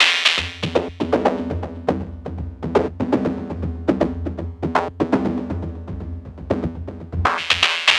Percussion, Trance NRG, Drums

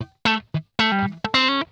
PLUK, Funk Guitar; 90 s Sample CDs; Zero G

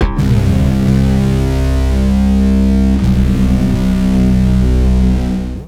Bass FX

